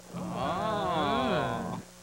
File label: uuoohhaa